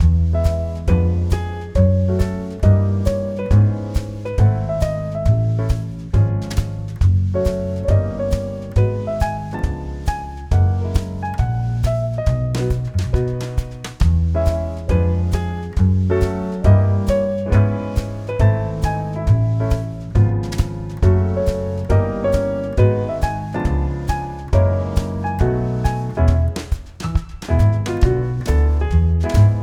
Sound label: ambience Music; europeanroulette3